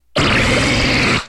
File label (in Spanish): Grito de Goodra